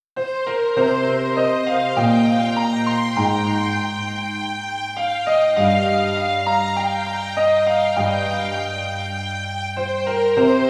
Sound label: (inst); Heavy Gauge